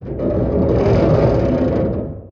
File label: damage vehicle; World Of Warships